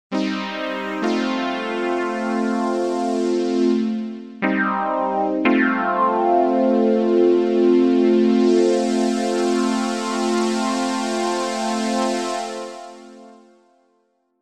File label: Moog Pad; PROTON3 refill